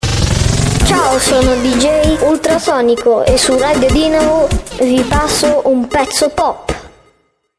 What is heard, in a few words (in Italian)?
pezzo pop